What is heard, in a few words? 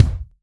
Kick